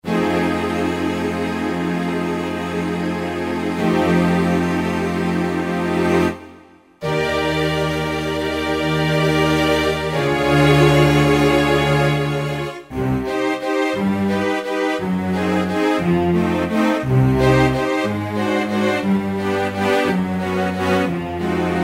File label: Soaring Strings, Roland JD-990; Factory Patches